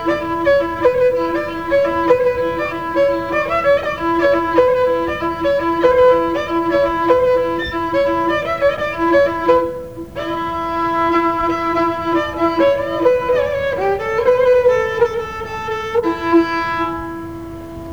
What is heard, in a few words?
Pandalash finale, Gadulka; Lesson Recordings; Nikolay Kolev - Gadulka Lessons